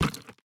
build concrete small; Factorio

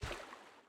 landfill small; Factorio

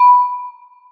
celesta, programmable speaker

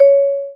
vibraphone, programmable speaker

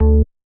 bass, programmable speaker

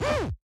spidertron leg

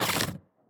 biter roar mid, creatures

